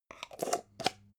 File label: jar plastic midsize close